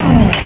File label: door small close